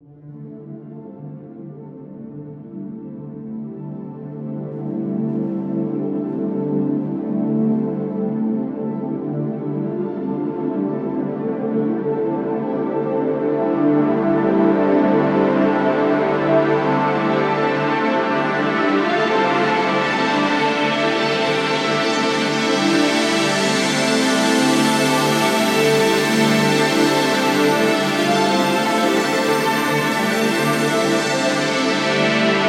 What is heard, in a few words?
washed arpeggio, Amin, 105; daily samples